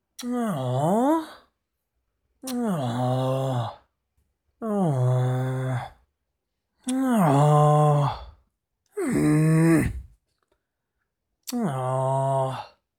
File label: A Few Groans Of Disappointment